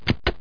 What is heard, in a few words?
lever up; landslor